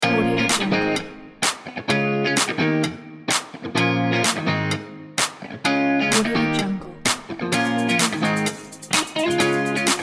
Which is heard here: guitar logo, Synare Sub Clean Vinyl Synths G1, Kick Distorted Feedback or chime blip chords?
guitar logo